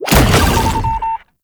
Proto Infested Brachiolyst Teleport Stop

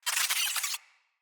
Space Drone Death; Enemies; Corpus

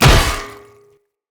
Great Sword Impact Armour; Weapon Impacts; Player; Swords; Combat; Founders Great Sword

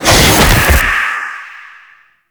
Stalker Teleport Cast; Warframe

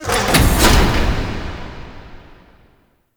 Door Generic Close; Warframe; Shadowgrapher; Ambience

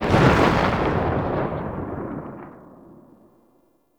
Revolver Rifle Tail, Weapons; Warframe; Tenno; Mod Quest

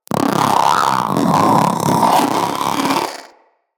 Companion Vocal Angry, Pets; Zanuka

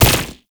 Concrete Archgun Projectile Explode; Warframe; Archwing

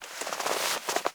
Classroom Move Foley; Warframe; The New War; Zariman

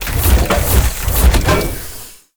Platform Extend; World Seeder Descent

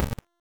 Veil Factory Shutdown; Venus; Kahl; Warframe; Veilbreaker

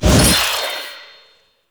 Scythe Whoosh; Weapons; Stalker Jade Scythe; Warframe

